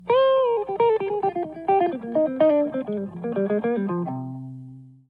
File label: Riff